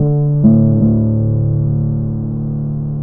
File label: bass, Loops